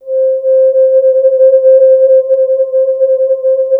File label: JX VOICES; East West; Phat & Phunky